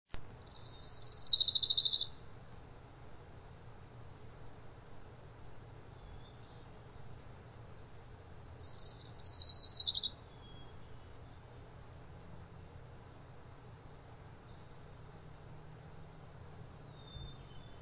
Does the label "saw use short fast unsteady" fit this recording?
No